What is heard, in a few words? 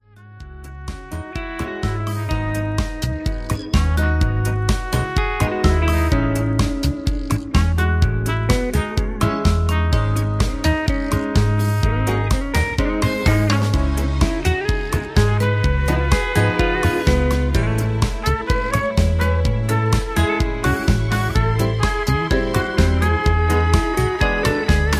instrumental medium lead trumpet